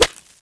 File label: Bullet Stone, Impacts